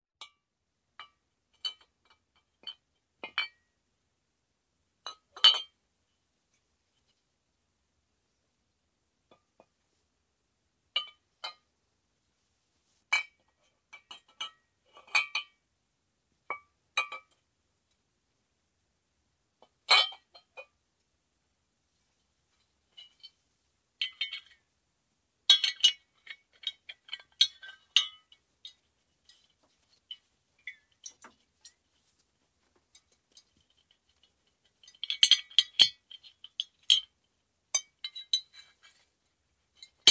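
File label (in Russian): clinking of bottles as a bartender handles them at the bar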